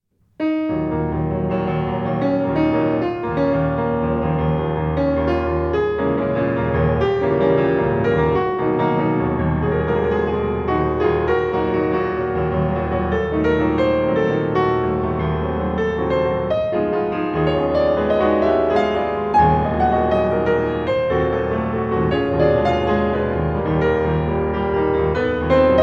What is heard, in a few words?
etude-tableaux e moll; rachmaninow